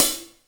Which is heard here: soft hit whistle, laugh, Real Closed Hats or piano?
Real Closed Hats